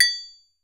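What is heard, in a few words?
frying pan, metal bells; Voice Crystal - Steve Reid Definitive Percussion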